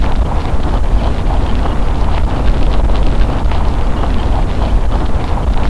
rough landing, aircraft; aircraft takeoff landing

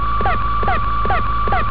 sensors, computers

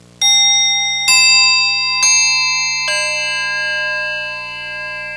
clock chime

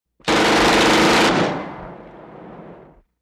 uzi, weapons

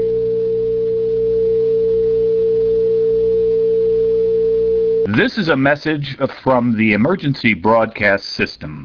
emergency system, computers

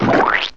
splurge, cartoon

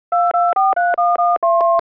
phone dial, telephone

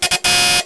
analog, sirens

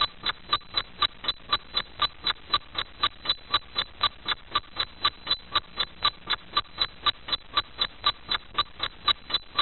clock tick

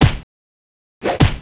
punch, fighting

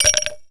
shell case; weapons

